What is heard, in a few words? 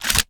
reload loop fast load; gmod